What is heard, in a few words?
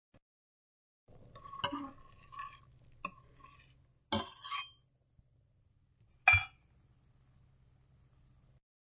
Spoon Metal Pot, Spoon banged on side of metal pot several times.; Kitchen; Household